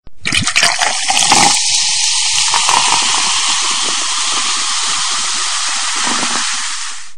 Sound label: Sparkle